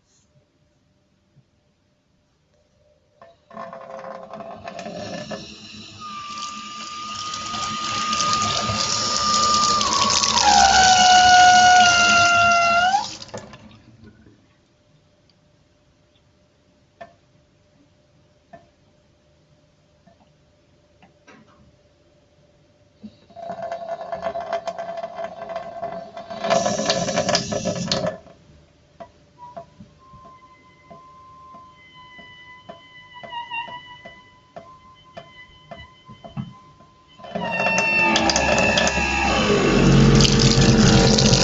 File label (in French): robinet